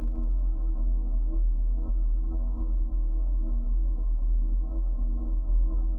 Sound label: Tonal Re Draw, sparse soundscape